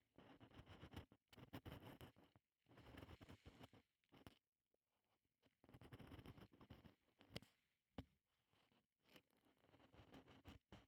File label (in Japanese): 耳かき, ASMR; メイドコスで色々な音